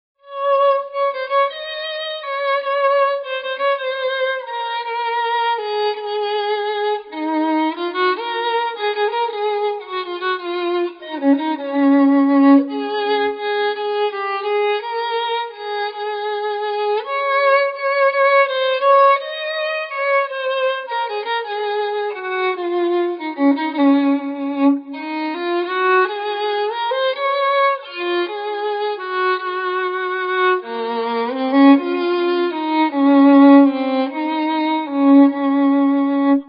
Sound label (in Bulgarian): Мелодия